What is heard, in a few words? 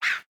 Monster Squawk